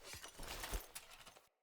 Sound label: Short Sword Accent; Weapons; Player